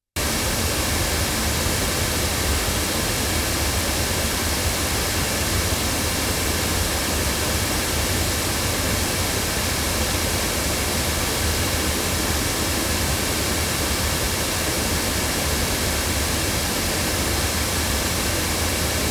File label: Hansen HCM-101 loudspeakers, microphones